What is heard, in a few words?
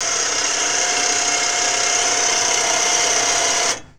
HYDRAULIC, Industrial; SFX